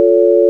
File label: sine